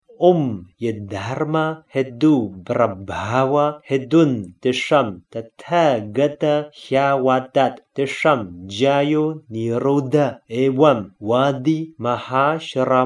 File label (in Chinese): 十二因緣咒(直誦); 缘起咒